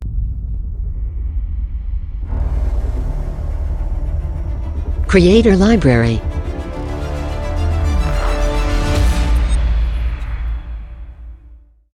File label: Epic Logo; Logo-Idents